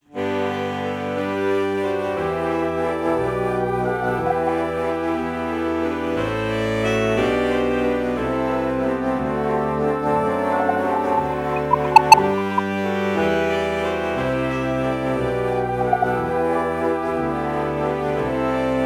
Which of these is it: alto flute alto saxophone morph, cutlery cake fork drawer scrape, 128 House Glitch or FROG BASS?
alto flute alto saxophone morph